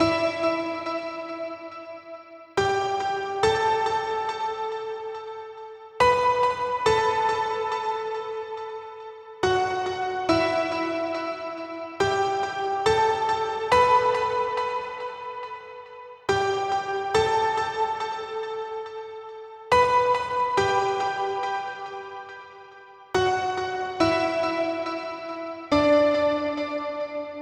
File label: Piano WET 140BPM, Eminor; Melody & Synth; Vengeance Samples